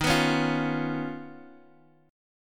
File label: Eb 7th Suspended 2nd Sharp 5th, Guitar Open G; down